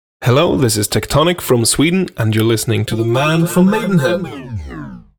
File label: shout